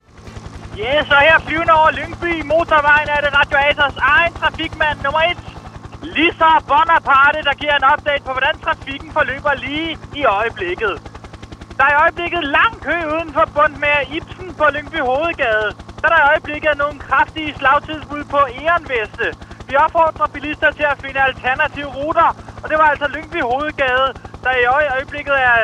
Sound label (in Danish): Helikopter